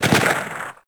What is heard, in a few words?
Weapon Justice Rifle Fire Body; Warframe; Caliber Chicks Minigame; Weapons